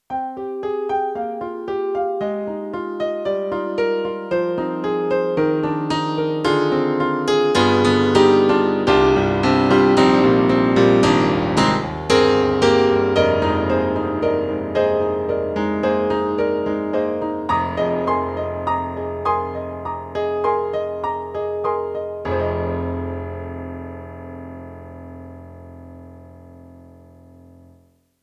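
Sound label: YAMAHA MU1000EX, Brite Piano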